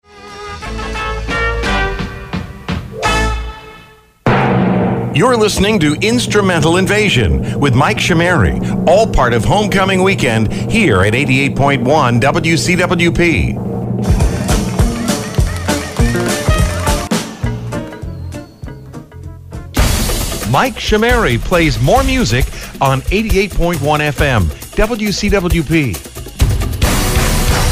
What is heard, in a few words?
instrumental fm stream transitions